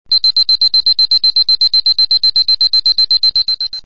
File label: High beep